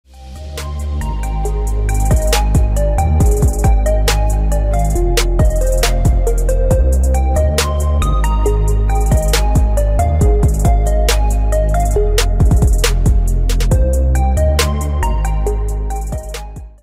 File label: Instrumental